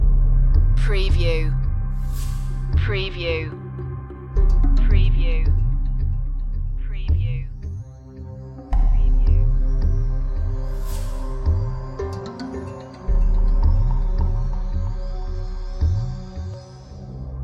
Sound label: african fields loop